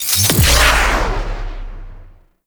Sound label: Speed Boost; Warframe; Colonist Rescue